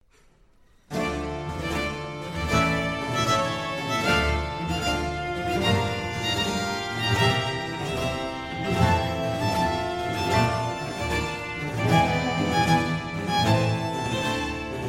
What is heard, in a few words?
Concerto for Strings in D Minor, Largo; Vivaldi; Concerti per archi II; Concerto Italiano